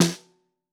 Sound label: SN Bottom, Snare